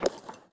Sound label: menu tabs move, user interface; gmod; mw; iw8